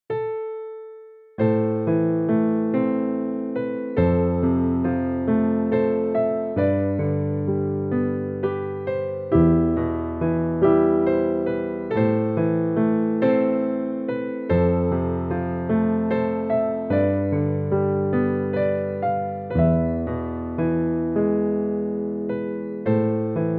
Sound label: Kontakt 5 Recorded MIDI render